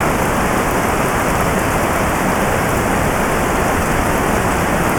GF Rain Amb; gnarled forest

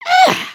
wake up; emotes

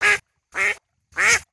Drake Quacking Water; destructibles

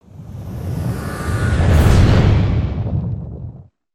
asteroid Path

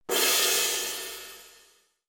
cymbal

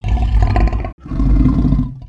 Pet Dragon Growl; creatures pets

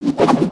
gun spin; weapons; aronwk collection; ndaudio

